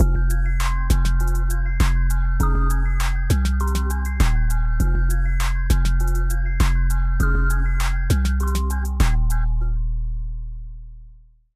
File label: counter melody, latin trap; 副旋律; 有些干; Balkim